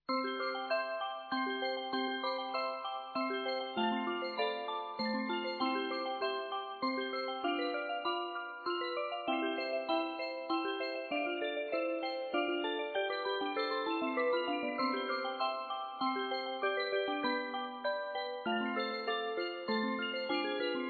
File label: bgm; restaurante